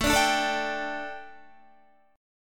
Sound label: A 7th Suspended 4th, A, down, Guitar